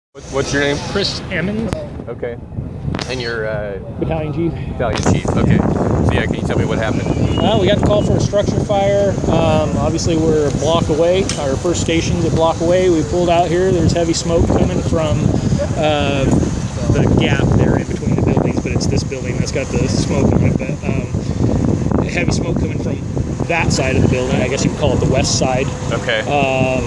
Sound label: fire